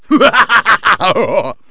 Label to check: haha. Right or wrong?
Right